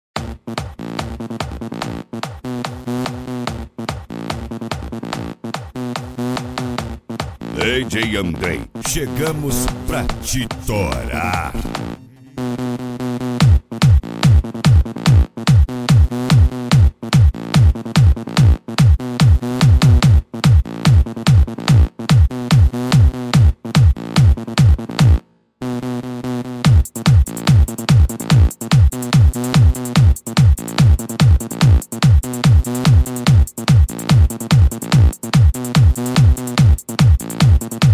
pancadao funk bass

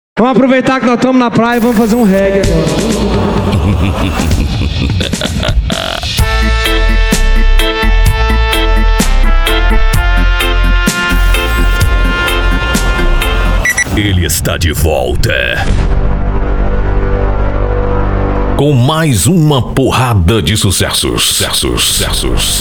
reggae; saveiro black power volume 2

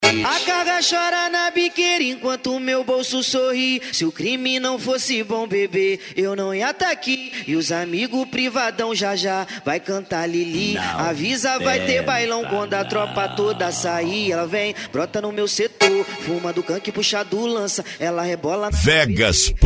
funk mega bass

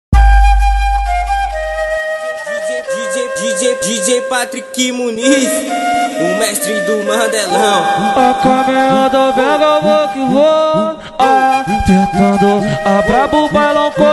especial funk; cd bad boy som automotivo usa

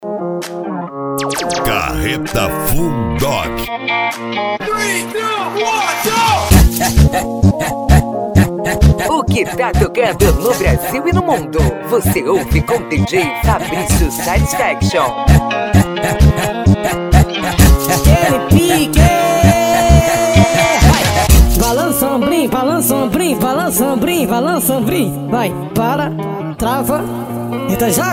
funk

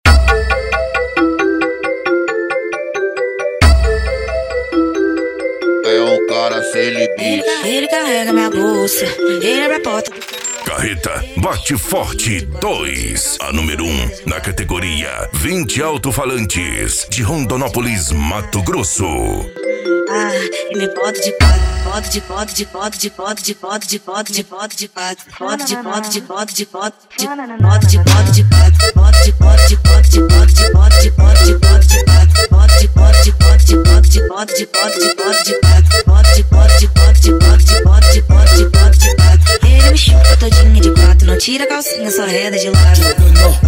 midback e funk mega bass

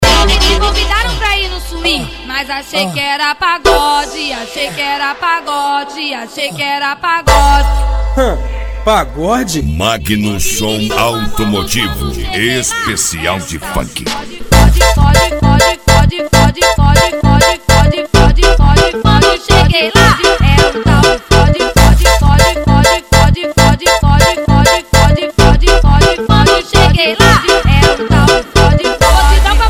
funk

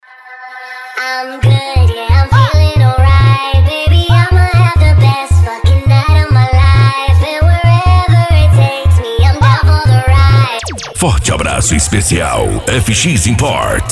funk mega bass

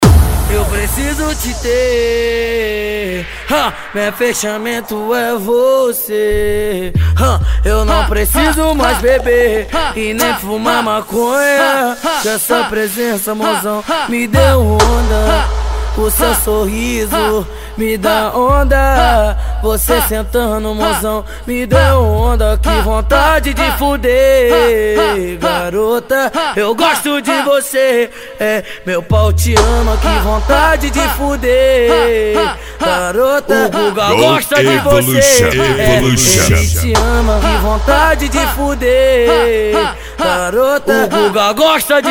funk tumdumdum funk bass

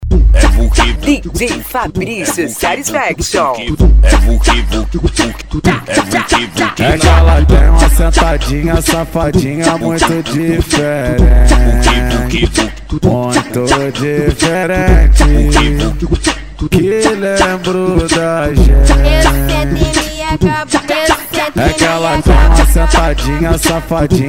funk

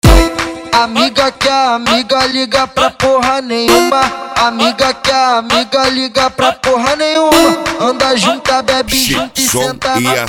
especial funk

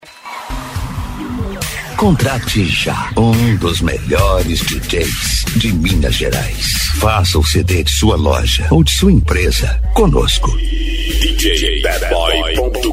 funk bass

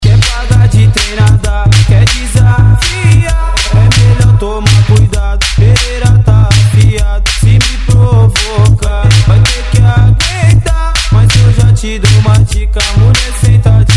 funk bass; impacto som

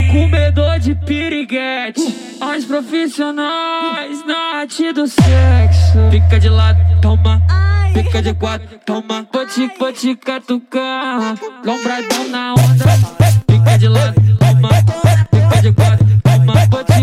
funk bass

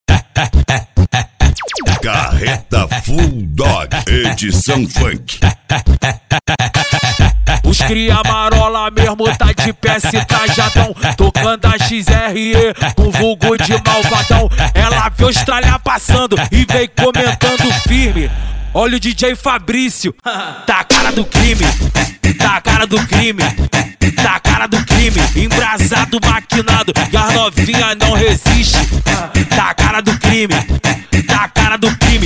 funk

